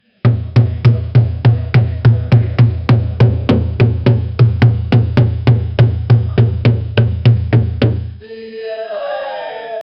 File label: demonic drum beating and